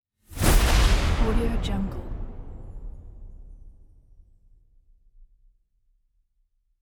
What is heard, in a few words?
morphing